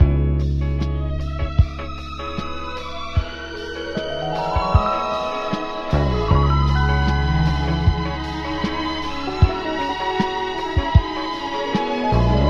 full, Loops Phonky

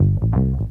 bass, Loops Phonky

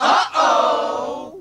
"uh oh", crowd; ooh ahh; uh um

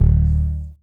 grinder, Bass; Notes

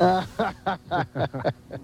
laugh

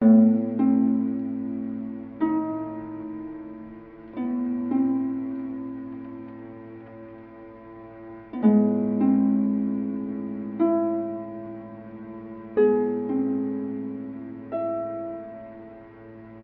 guitar, Loops, Sad

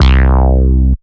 gulping, Synths; Notes

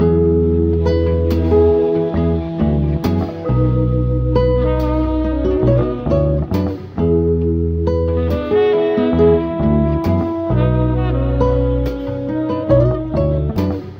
guitar and sax, Phonky Loops

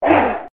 huh evil, Voice; ooh ahh; heh huh

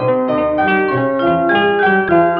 keys, Phonky loops

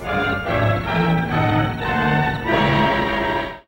horror movie strings

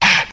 "ah" loud airy, Voice; ooh ahh

